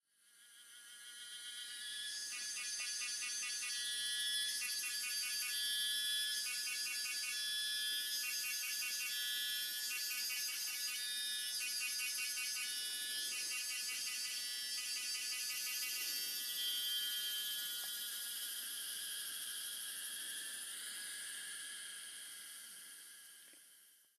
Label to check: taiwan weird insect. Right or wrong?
Right